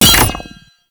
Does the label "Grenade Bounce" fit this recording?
Yes